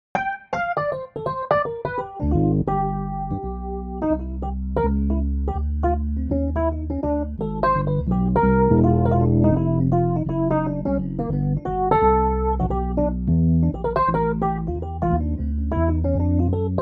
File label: Dyno My Rhodes